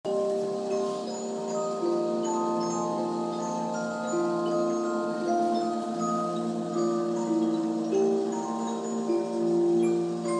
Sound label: Polyphon musical box; at falkenberg museum c 1890